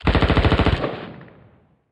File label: Rapid